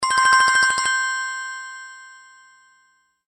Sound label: Samsung ringtone Chime